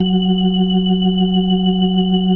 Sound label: F#3F, B3, Vintage Keyboards